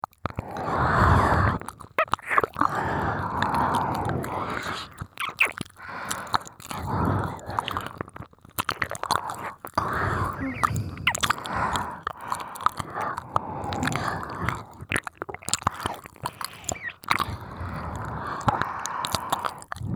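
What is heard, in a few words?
ear licking only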